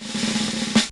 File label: SNAR END; Crypton - DrumBase5000